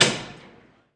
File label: Industrial Door Switch